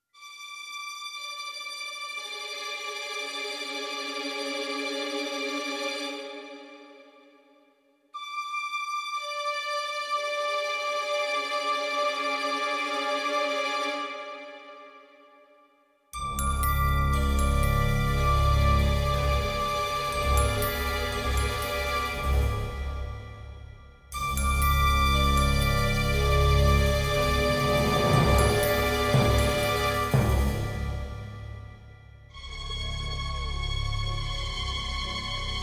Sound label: creepy